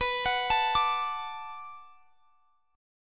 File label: B Minor 7th B, chord; Bm7 arpeggiated